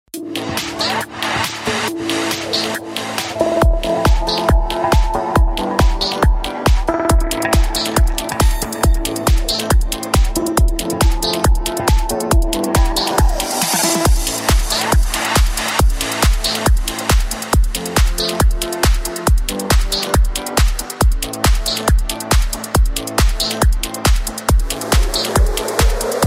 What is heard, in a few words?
Drumstep Music; sport